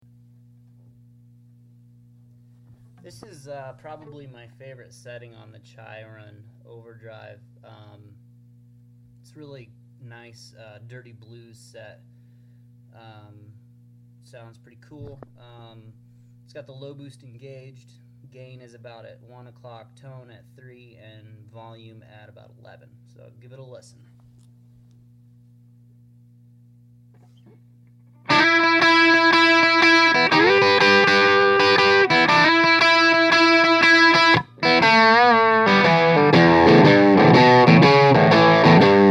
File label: overdrive dirty blues